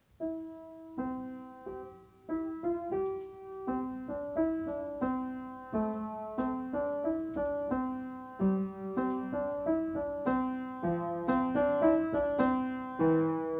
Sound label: piano